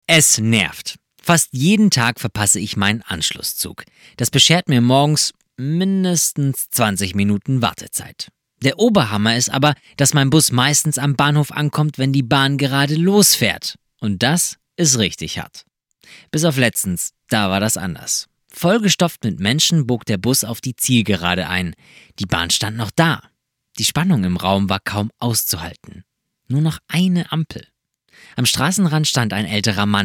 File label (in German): klatschen